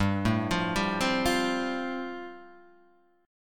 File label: G 7th Suspended 2nd Suspended 4th, Guitar Half Step Down